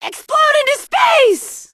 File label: Wild Magus Doom